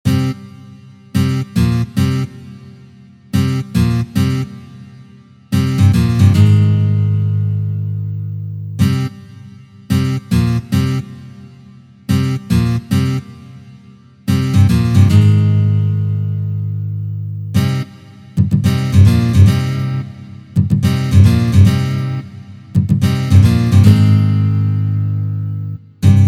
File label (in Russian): Uprazhneniya Rok na gitare